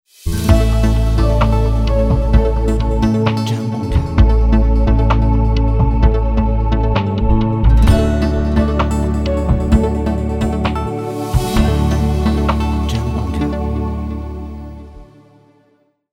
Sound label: Corporate Electronic Logo